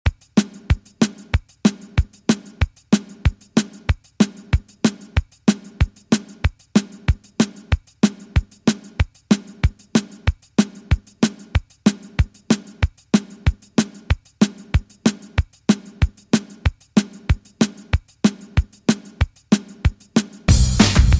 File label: Dj In Out Redrum 188Bpm